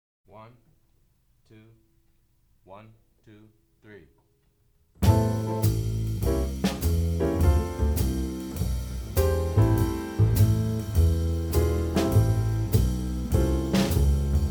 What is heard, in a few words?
A minor Blues Piano Bass Drums; jazz